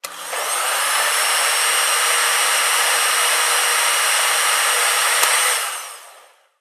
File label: Blender Machine Run; appliances